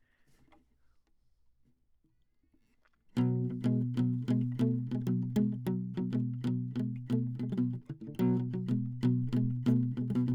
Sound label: Classic Guitar